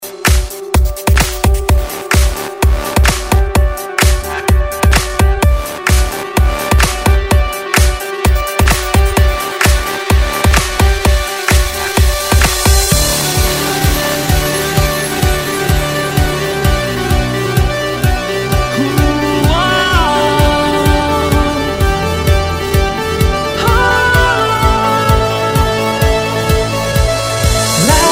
deep house and brasilian bass

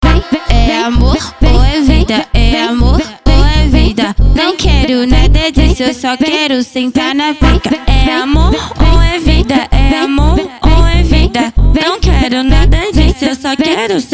funk; coruja som car e borracharia do coruja

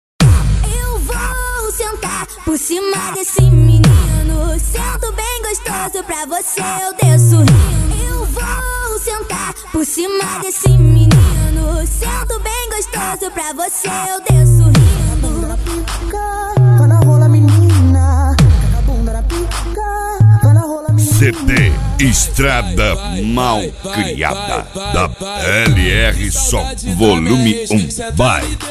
funk bass

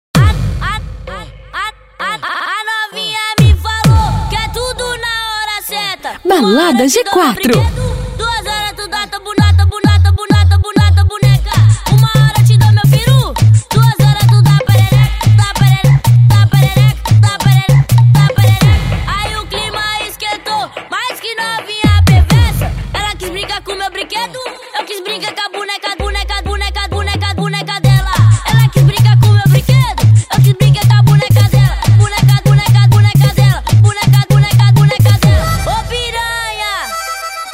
funk bass; lada g4

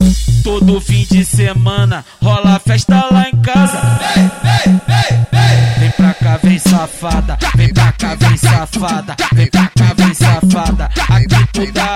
funk bass

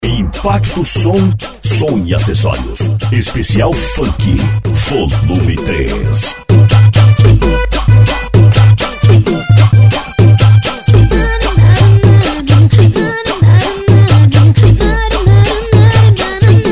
funk bass; impacto som

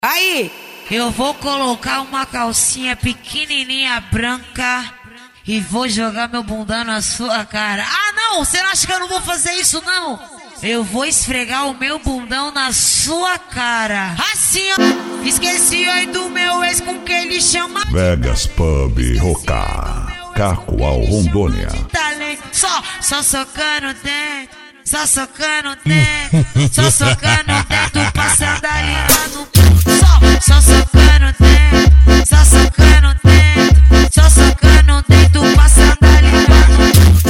funk mega bass

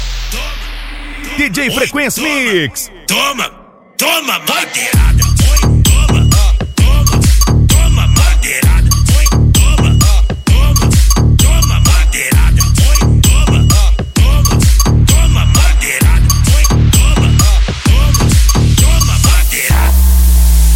sound car

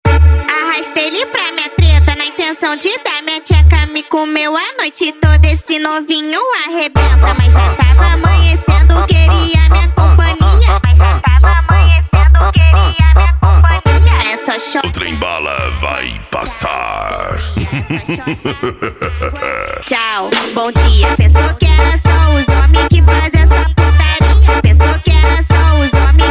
funk mega bass

